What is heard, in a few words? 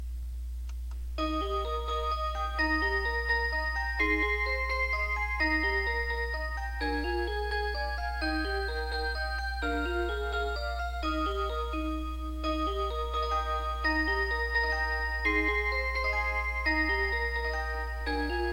Twinkle Twinkle Little Star